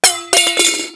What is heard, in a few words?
phantom child die